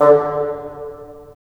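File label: WND BN, wind bassoon; LA Composer vol. 3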